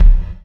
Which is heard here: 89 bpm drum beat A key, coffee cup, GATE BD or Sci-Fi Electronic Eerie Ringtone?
GATE BD